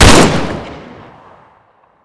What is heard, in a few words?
lightning; weapons